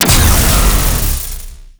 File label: Shield Down; Warframe; Captain Vor; Abilities Redux